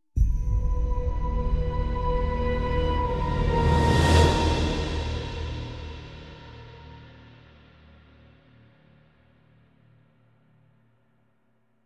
Elevator Call; beyond two souls; Black Sun

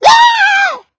Sit leg poke Sfx

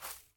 Impact Light; Puddle

